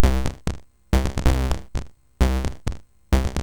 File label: Fire Breath, MOS6581R3